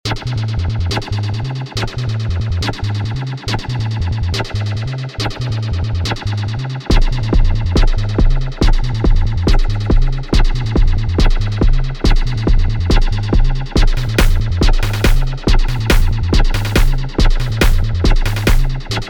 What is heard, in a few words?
Neutron acid test, DN2+Cobalt8+Neutron; Some Neutron acid test friday fun